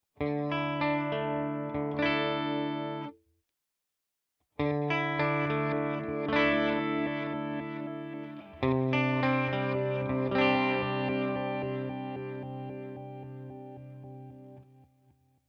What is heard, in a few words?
Walrus Fundamental DELAY Reverse